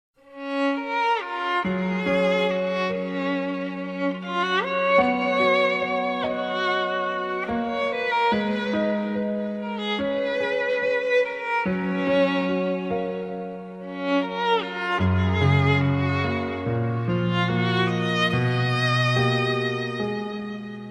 Violin

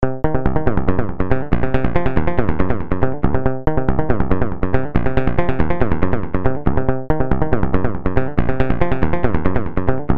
Cool Sms

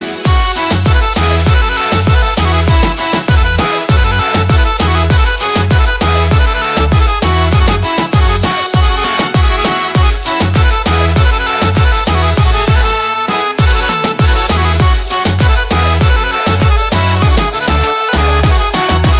beat

